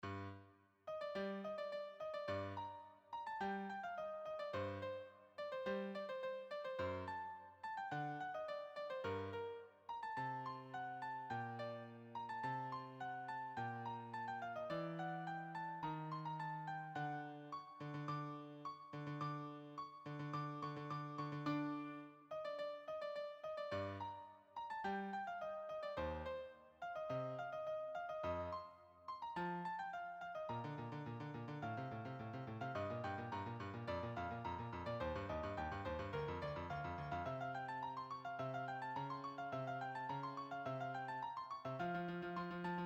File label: Melody Bass